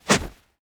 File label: salvaged icepick attack; Rust